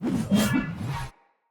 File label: Great Sword Pre Whoosh Spin; Weapons; Swords; Dendrit Ara Great Sword; Accents